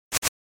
SNES RPG Miss

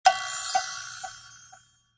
Accent

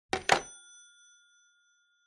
Rotary Phone Hardware Receiver Hang Up

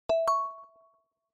Smartphone UI Sound Dry

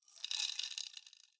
Spice Mill Pepper Refill

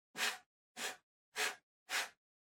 PC Mouse Move Far Hard Pad Short

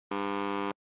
Quiz Buzzer Mid

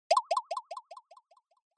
Percussive Accent High Delay

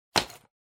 Pencil Case Put Down

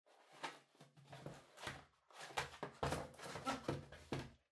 Cardboard Box Far Fold; ダンボール; 遠い 畳む; 梱包材

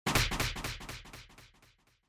Motion Grab Delay

